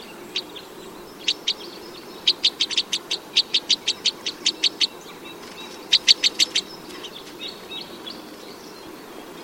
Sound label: Two-barred Crossbill Type N19 - FCs